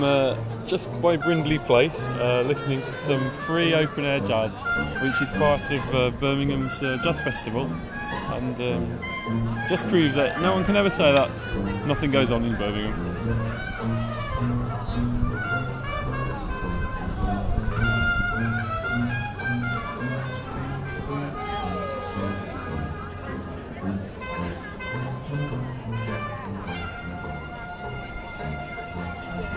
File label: jazz